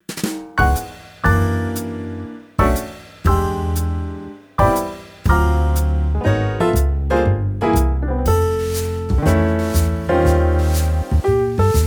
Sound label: Jazz